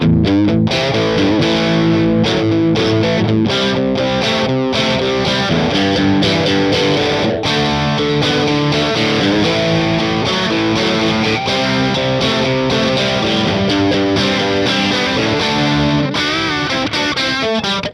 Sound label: vintageplus DISTO HARD ROCK, guitare